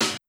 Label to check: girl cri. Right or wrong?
Wrong